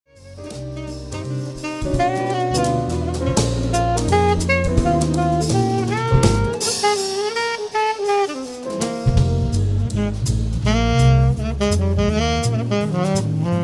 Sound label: Quintet Cocktail Jazz Live Event